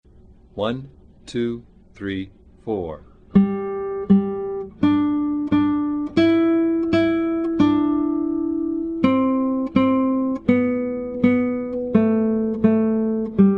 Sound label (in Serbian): Twinkle Twinkle Little Star, Gitara